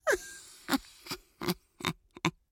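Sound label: ambience China 3D ambience; rabbids multiverse; iOS, macOS, Mobile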